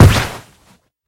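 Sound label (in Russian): громкий чёткий короткий звук выстрела из пушки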